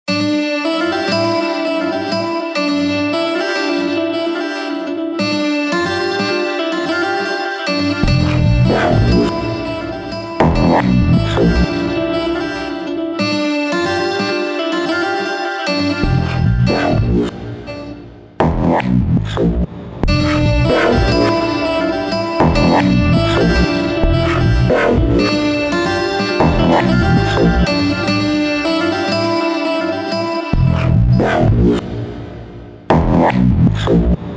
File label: guitar randomer